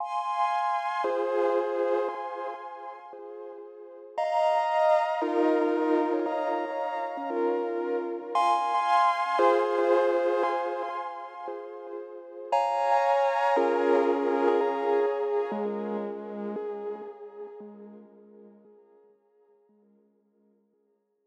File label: Oddity Repetetive